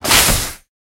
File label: aerobatics smoke start; War Thunder